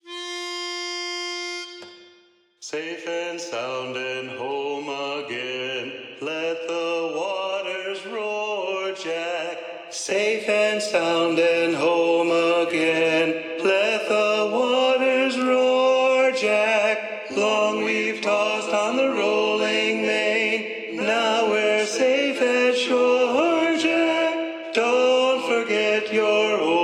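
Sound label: Tenor Predominant; Don t Forget Your Old Shipmate; sea shanties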